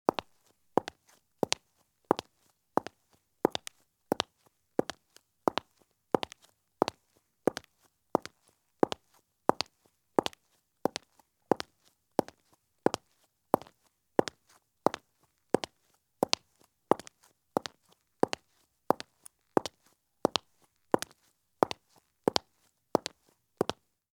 Women's Medium High Heel Shoes Walk at Fast Pace on Concrete; Sound Ideas; Footstep Sound Effects